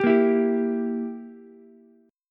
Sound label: E Diminished E, Ukulele; Edim strummed; JGuitar